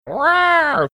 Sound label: "rawr"